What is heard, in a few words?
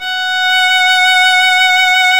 STR VL F#6, STRINGS; Roland - LA Composer vol. 1